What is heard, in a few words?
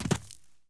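land heavy, dirt foot; The Elder Scrolls III - Morrowind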